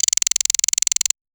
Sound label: mouse wheel